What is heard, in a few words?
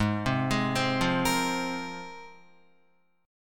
Ab 6th Add 9th, Guitar; 4,3,6,5,5,5; Ab6add9 arpeggiated